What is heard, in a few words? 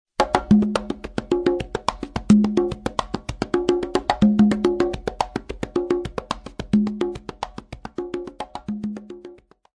CONGA, flamenco